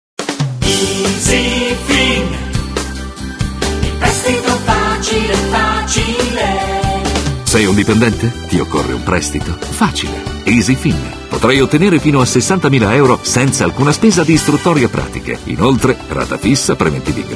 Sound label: Jingle